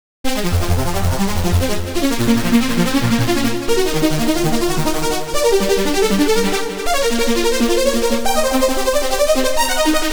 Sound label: uranium arp; the space soundset